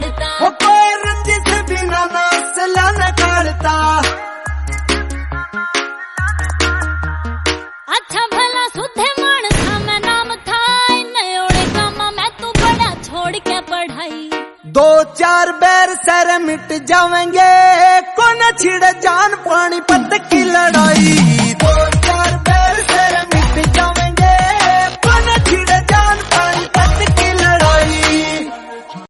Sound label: song haryanvi